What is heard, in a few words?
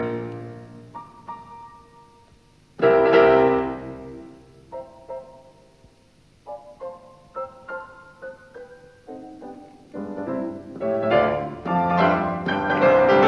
Variations in C major on a Waltz; 33 Variations; Op 120; Variation 13; Vivace